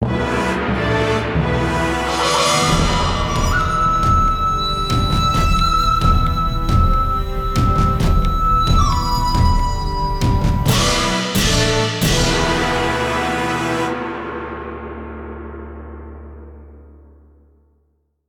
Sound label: Jungle Chess Win; madagascar escape 2 africa; xbox 360; ps3; wii; ps2